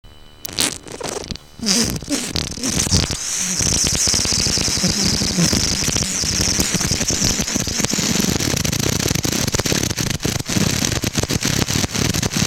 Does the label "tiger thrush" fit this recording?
Yes